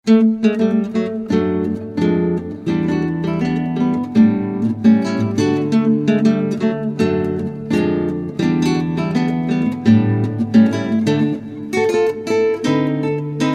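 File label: Samba, Guitar; Latin Duets vol.2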